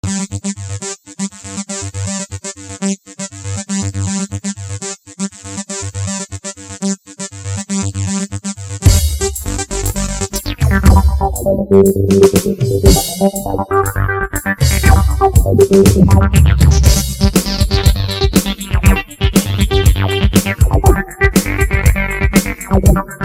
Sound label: hard rock loop